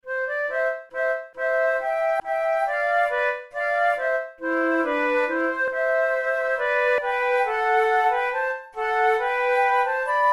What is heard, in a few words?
duet, flute